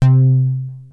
Access Virus B; Synthworx

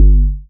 BAS G1, 2 Step; USB Soundscan vol.51; House Side Of 2 Step; AKAI; BASS

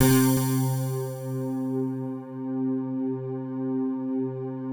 digi pad, Pads; Best Service; XXL 800 Pads; Sample CDs

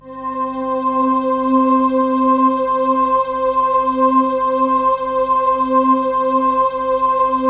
PAD8 C5; Explosive Jungle; USB Soundscan vol.05; AKAI

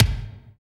BD, BD REAL

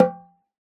oxaca, percussion; ILIO

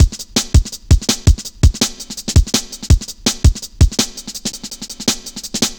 Virtual, Drum Loops, 165.5, Jungle

